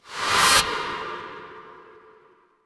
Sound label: transition whoosh